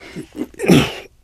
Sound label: launch moan; eli; Portal 2; Source Engine; video games